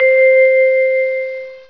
vibes; Dark Forces Demo; Dark Forces Instruments

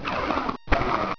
lever; Freeverse Software; Enigma v1.4

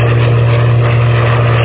plane; marathon

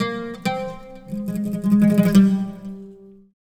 SITAR ETHNO 125; eJay; Guitar

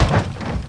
SCRAPE; Andretti; INDY

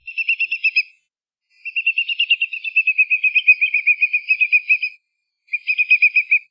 whimbrel, Songs; The Ultimate Birder

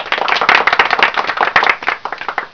CLAPPING

